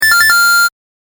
correct; ファミコン風のピンポン。低音ver; 正解の音・ピンポーン

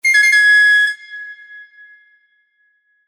correct; 和風のピンポンの超高音ver; 正解の音・ピンポーン

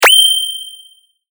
sword

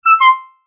correct; 馬鹿っぽい正解音の高音ver。; 正解の音・ピンポーン

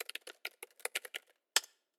Keyboard

